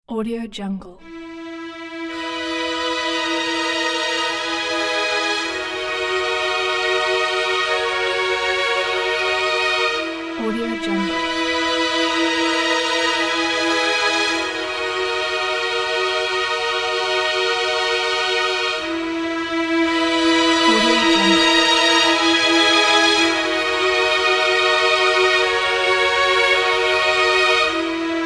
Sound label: sadness